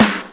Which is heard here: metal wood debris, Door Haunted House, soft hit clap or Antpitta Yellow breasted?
soft hit clap